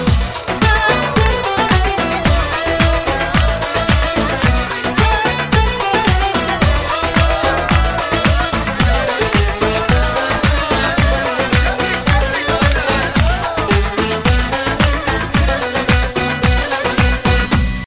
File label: turkish folk music ringtone